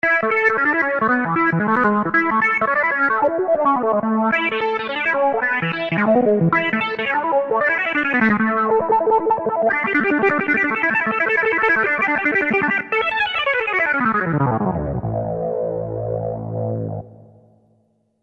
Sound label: polysix